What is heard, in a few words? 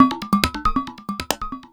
percussion loops 138; PS-45